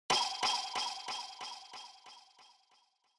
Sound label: Onoma Sigh Delay